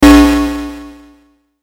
Hit Synth Fuzz, Hit Bass Flange Wah; Blastwave

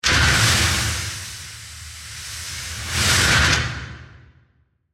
Hit Sweep Whoosh, Accent, Transition; Production Element, Imaging Element

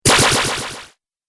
Laser Zap, Laser Gun

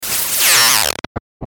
Tuner Digital Glitch Power Down; Communication

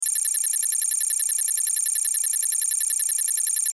Score Calculation; Buttons (Sound Effects:Multimedia)

